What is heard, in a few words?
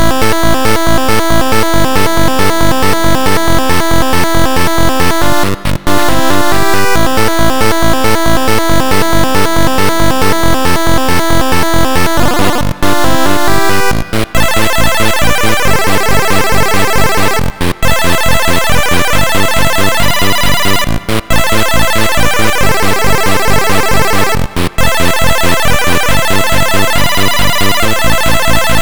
DARK BATTLE